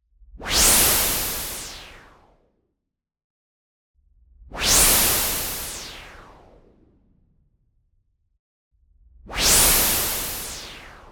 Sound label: WHOOSH Noise Filter Gust; boom library; Anime Essentials